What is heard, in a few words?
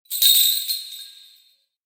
miko suzu